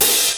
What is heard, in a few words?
Fairlight CMI III Ohh, Drum Machines